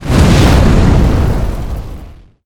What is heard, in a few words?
fire thrust